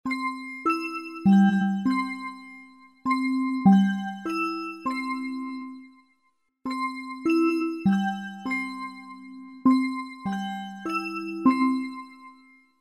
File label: melody train